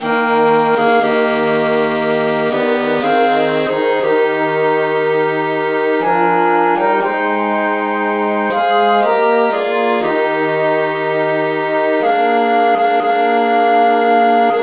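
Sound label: traditional key A flat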